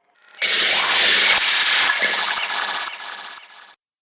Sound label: Extinction Laser Ray